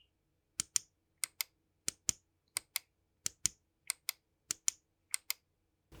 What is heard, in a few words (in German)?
Seitentasten; Aerox3